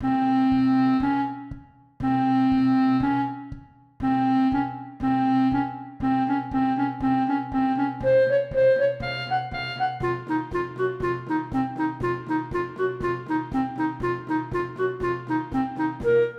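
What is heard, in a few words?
clarinet; Borgertog2019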